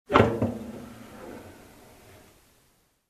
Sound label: Locked comparment